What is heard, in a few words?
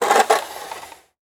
Weather Snow Shovel Hard Then Soft On Driveway